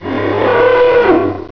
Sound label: alert, baby garg; svencoop